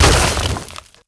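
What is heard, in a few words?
break wood small; Spearhead Demo; MacFormat 2003 June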